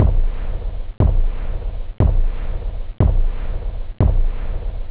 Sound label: hard, drums techno; OctaMED SoundStudio V1